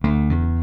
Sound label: slap